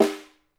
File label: SN RIM, Percussion; Miroslav Vitous - Percussion & Harp